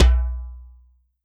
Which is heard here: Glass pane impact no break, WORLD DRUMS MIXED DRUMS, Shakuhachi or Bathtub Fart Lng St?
WORLD DRUMS MIXED DRUMS